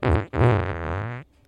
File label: f14